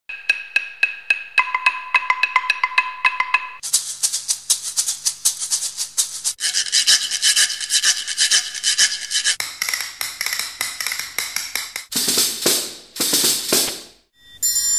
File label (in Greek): Κρουστά ορχήστρας